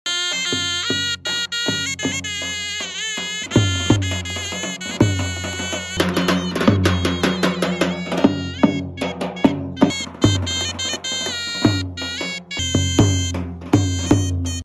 Zurna

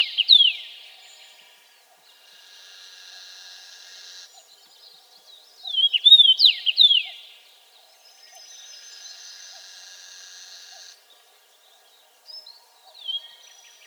Common Rosefinch; پرنده توت خورک

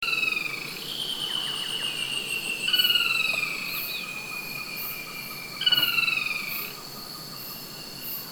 Pale legged hornero

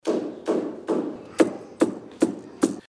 carpet weaving; قالی بافی

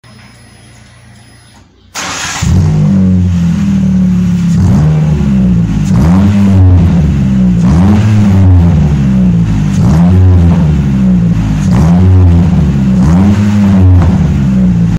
Tornado exhaust

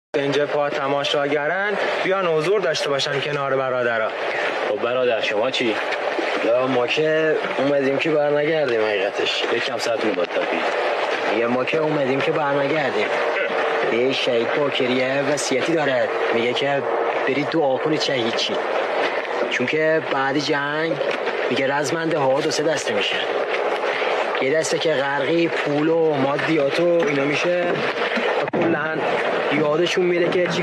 warriors, رزمندگان در جبهه

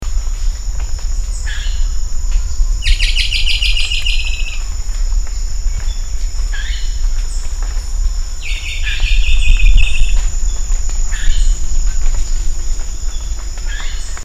Rondônia bushbird; پرنده بیشه مرغ راندونیا

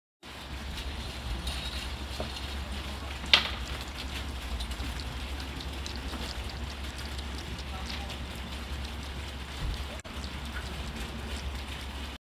cat drinking water; آب خوردن گربه

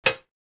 button

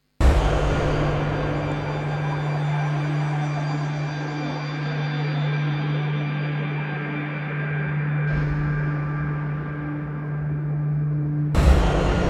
alien spaceship

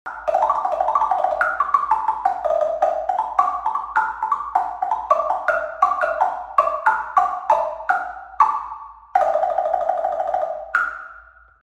temple blocks

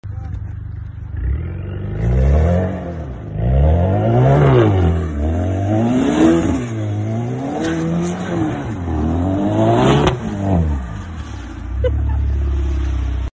Buick; اگزوز

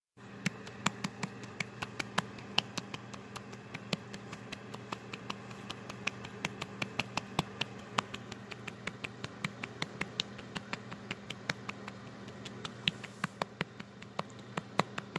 Hitting stomach

